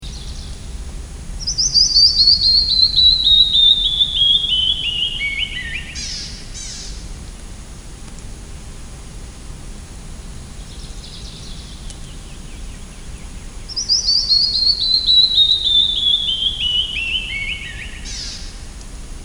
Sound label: Canyon Wren Song